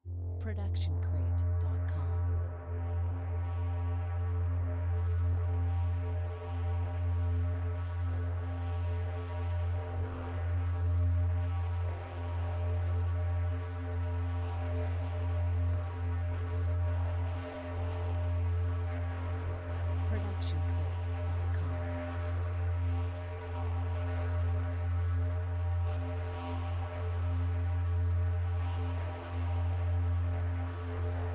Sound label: static tension; soundscrate